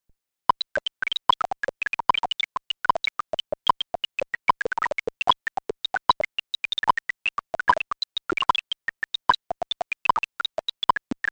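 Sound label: sample stimulus trace for the experimental condition of an experiment in which subjects had to tap in synchrony to clicks; 10 ms tone bursts of 1000 Hz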